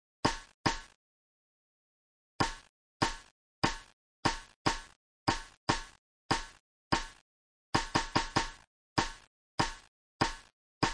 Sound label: funk tam